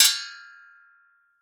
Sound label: metal ringout; sonniss gdc